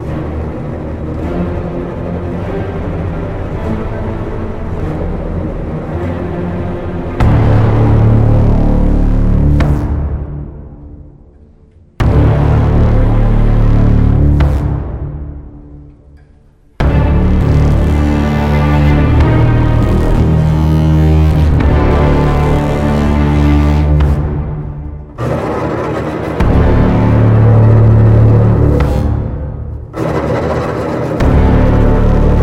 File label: tanker arena combat, music; c17